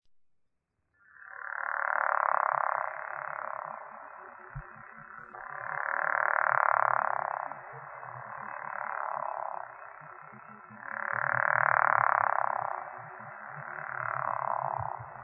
Pickerel Frog; Wildlife

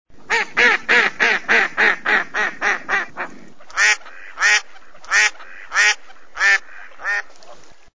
mallard duck

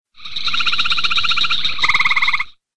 gray tree frog

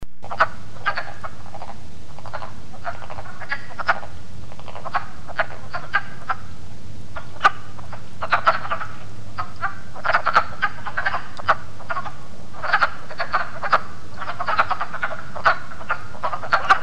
Wood Frog; Wildlife

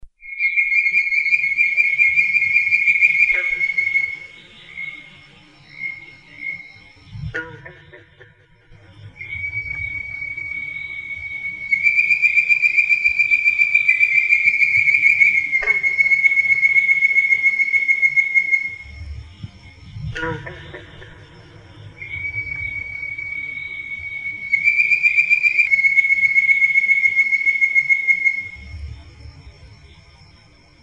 Bird-Voiced Treefrog; Wildlife

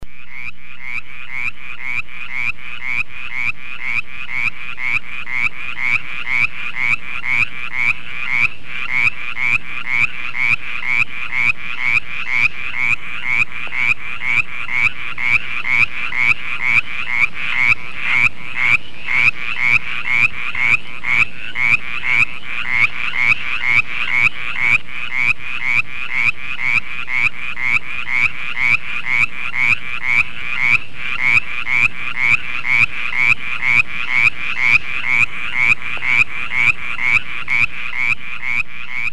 Mountain Chorus Frog; Wildlife

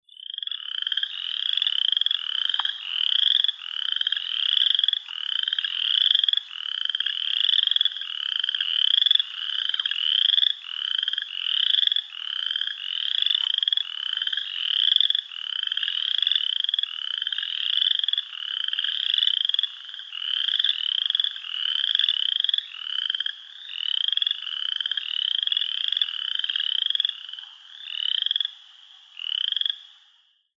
Midland Chorus Frog; Wildlife

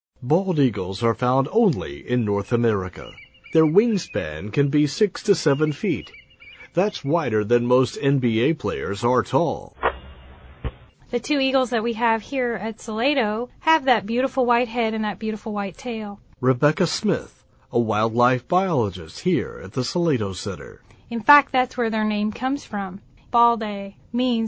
bald eagle

